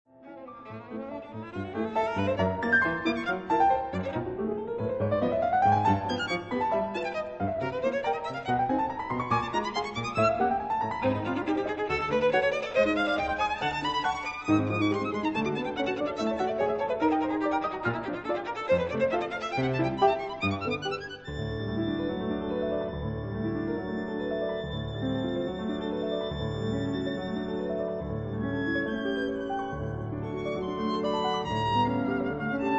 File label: Sonate violon piano